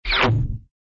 fweet thump; cartoon; hannah barbera cartoon sound fx

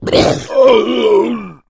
boomer shoved, hit; player; source engine; left 4 dead 2

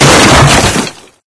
wood crate break, physics

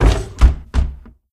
medkit doors open

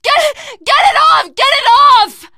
scream while pounced, teen girl, survivor voice; source engine; left 4 dead 2

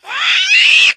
spitter spot prey, voice; source engine; left 4 dead 2

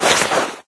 taunt yeti standee clothes rustle; player; engineer; source engine; tf

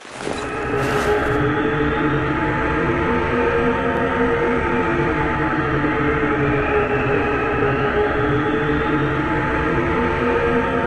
taunt broom fly; video games; source engine; tf

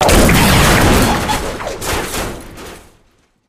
pole and wires; video games; source engine; portal2

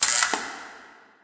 light flicker; Source Engine; Portal 2

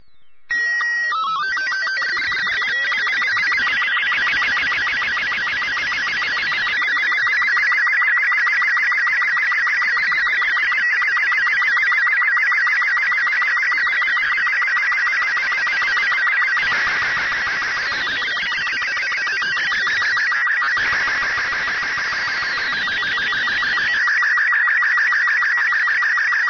ambient; Portal 2; Source Engine; video games

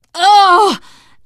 reaction disgusted, teen girl, survivor voice; source engine; left 4 dead 2

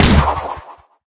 bow shoot; tf

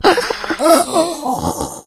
death, infected; Left 4 Dead 2; Source Engine

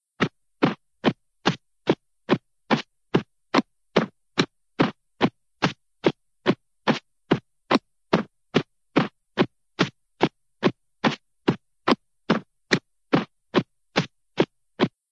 walking feet; cartoon; hannah barbera cartoon sound fx

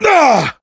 melee swing, player survivor voice

